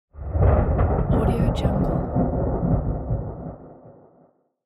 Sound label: thunder